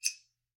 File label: scissors